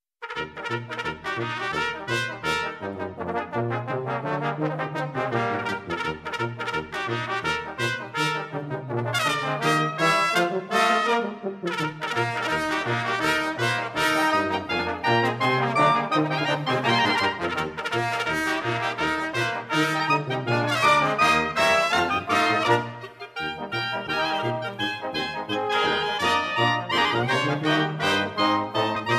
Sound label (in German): Feuerwehrgalopp